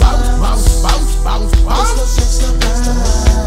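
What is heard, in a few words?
bounce sample E major?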